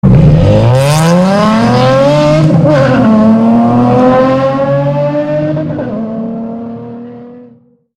350z Acceleration

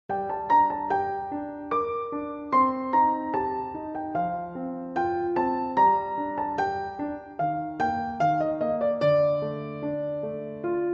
Piano; Plevne

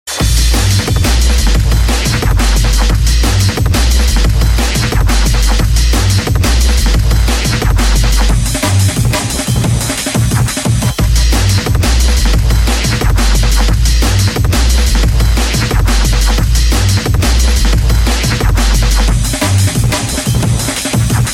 Dnb Music

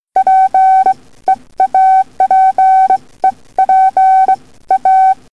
SMS Morse Code

